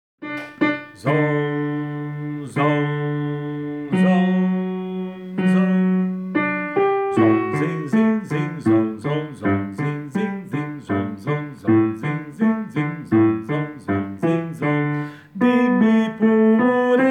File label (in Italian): baritoni; o pinota